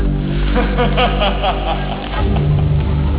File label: laugh; draven